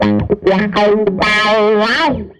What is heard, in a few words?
Tele Guitar Loop